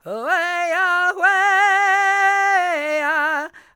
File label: vox